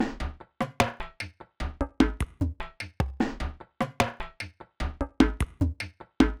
Perc Loop 150 BPM; Future Bass; Drums; Top; Heater

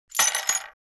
Coins Dropped on Wood Counter; Fairlight Sound Lib

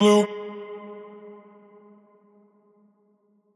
Wet One Shot - G#, Vocal; Tonal One Shots; Cymatics; Euphoria Vocal Sample Pack

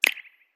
Snap; Cymatics; Lofi Starter Pack; Eternity

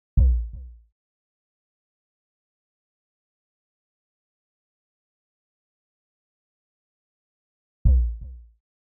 Drum Loop - 125 BPM Percussion; Cymatics; Antidote Drum Loop

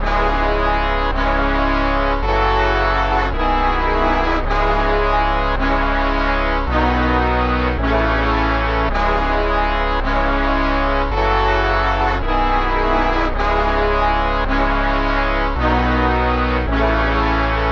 Victory 108 BPM C# Min; Melodies; Cymatics - Immortal Production Suite Beta

